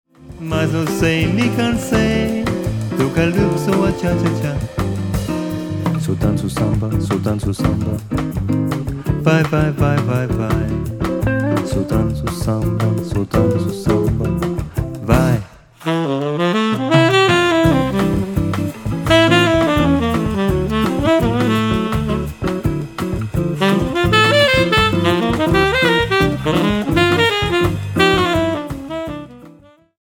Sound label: Samba; SoDanco